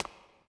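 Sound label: reverb run, footsteps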